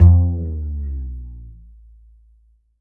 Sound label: Upright Acoustic Bass; 1ST POSITION; Larry Seyer